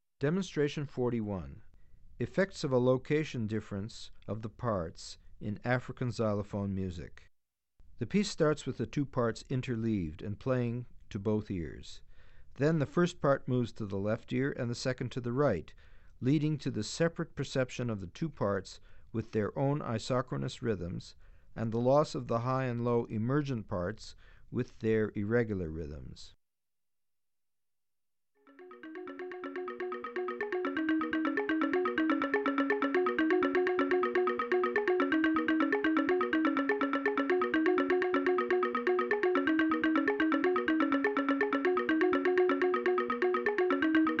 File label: African Xylophone Music; Effects Of A Location Difference Of The Parts; Bregman ASA; auditory demonstrations